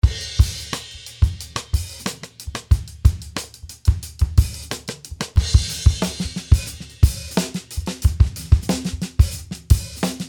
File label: Crispy Beats